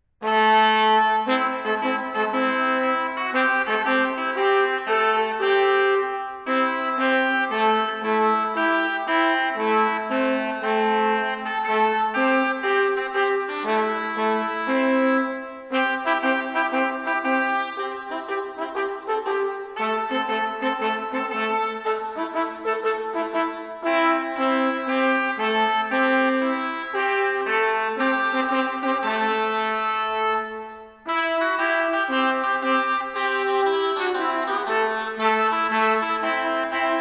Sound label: for trumpet & winds